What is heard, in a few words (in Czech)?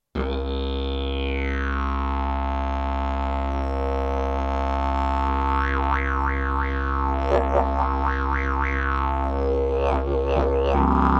javor, didgeridoo; javor hrbohlav